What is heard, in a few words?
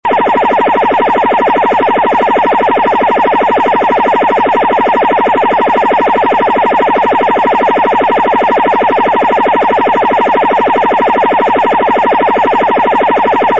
sound swinging carrier